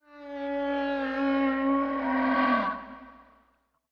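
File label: Animal Stinger Eerie; stingers & whooshes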